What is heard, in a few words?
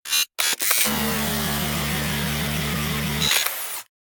module move, ships; CosmicRage; CosmicRageSounds